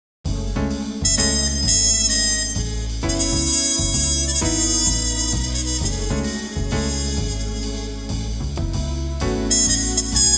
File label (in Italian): suonerie musica jazz